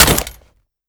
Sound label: melee hit world; arccw